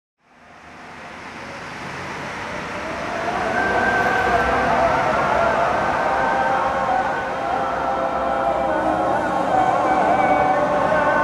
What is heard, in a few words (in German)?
Straße Sirene Muezzin